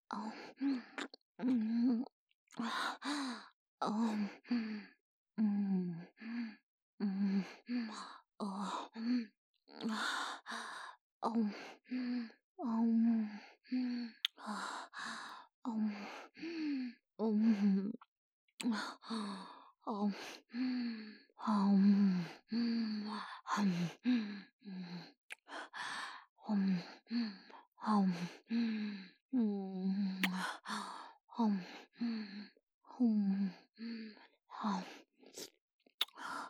ディープキス

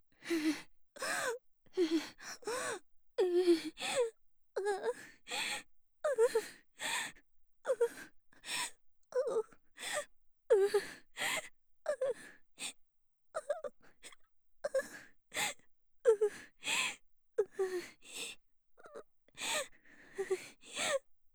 喘ぎ声 凌辱

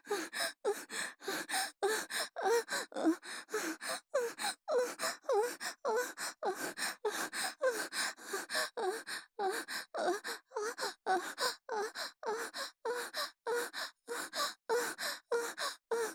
喘ぎ声 中

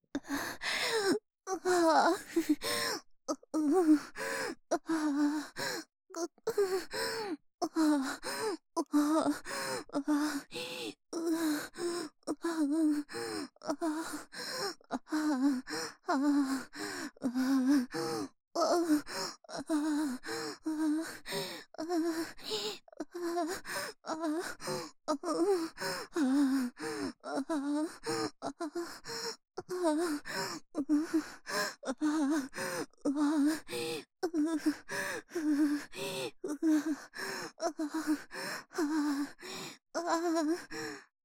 喘ぎ声 小

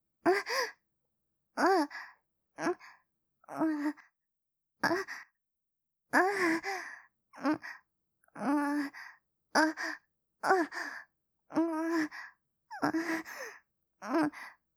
喘ぎ声 小

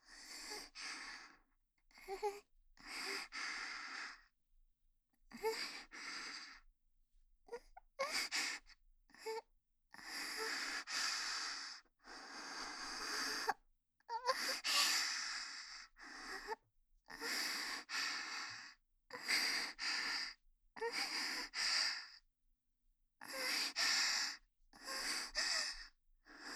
喘ぎ声(極小)

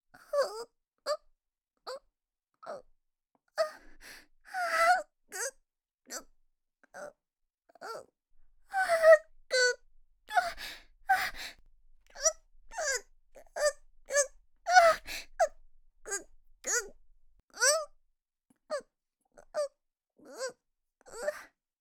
喘ぎ声（中）

